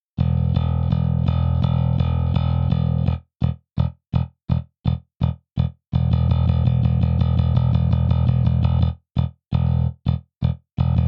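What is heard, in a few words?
70 J Bass Default setting Pick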